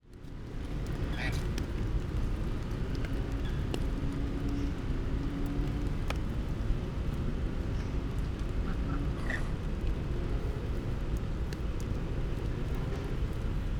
Close goose vocalisations crow calls rain people passing; mikroUši